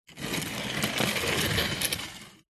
office chair roll on tiled floor one wheel slightly jammed